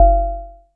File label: DULL, PERC HITS; Zero-G Ambient 1